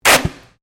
Sticky Tape Unroll; common tape; Offices; SoundEffects-Foundation4

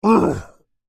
Creature Grunt Short, Human; Voice

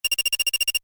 Computer Beep, Short; Multimedia; Stuart Duffield

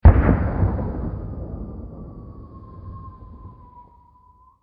War Sounds Explosions, Bomb Sound Effects

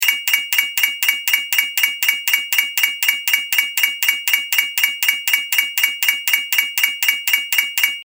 Timer Loop, alarm; electronic; Loopable

